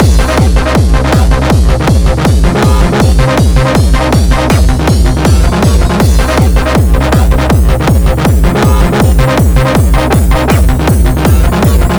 Beats Loop; Anger Foot

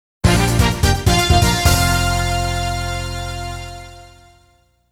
Draft Designation Jingle; Jikkyou Powerful Pro Yakyuu; Power Pro Music Hall 2

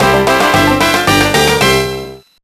Unused Jingle

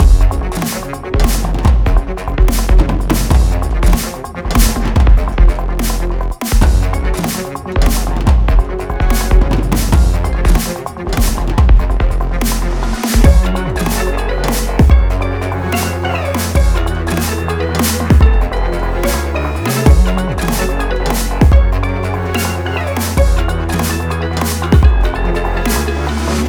Boss Battle Main Loop; Glitch's Trip; 3rd Stage

